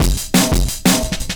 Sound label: Drop Out 175, Drum&Bass Breaks; EZ Rollers